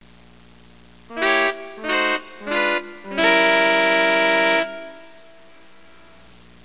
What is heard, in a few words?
synth; Broderbund Print Shop Multimedia Organizer Deluxe Edition